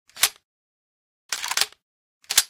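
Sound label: sig550 grenade load, weapons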